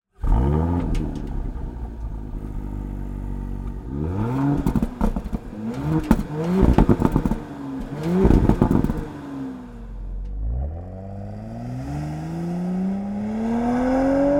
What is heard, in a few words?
Stock exhaust Porsche Cayenne 536